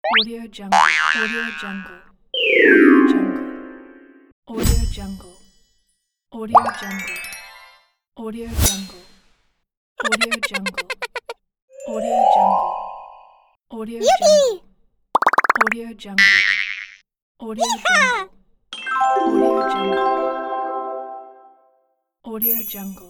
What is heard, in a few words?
cartoon sound